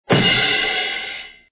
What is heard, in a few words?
cymbal crash; CFL; Imagers; TimeFX